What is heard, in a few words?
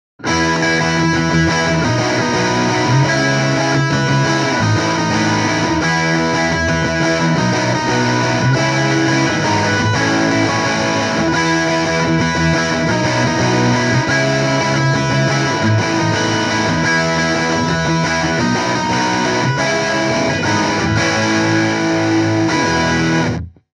Crush Power Pop Double Track